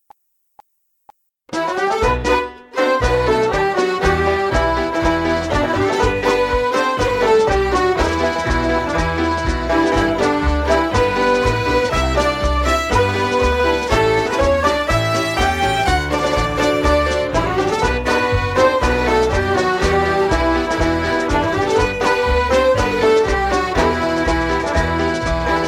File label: Irish Ceili Marches Medley Em, backing track